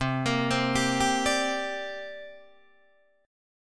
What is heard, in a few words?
C 7th Suspended 2nd C down Guitar Open D